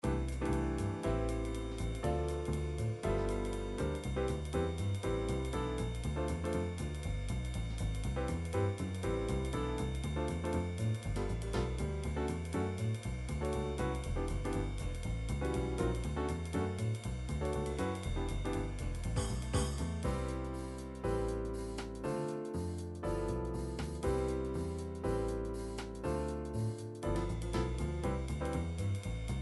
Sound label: jazz demo song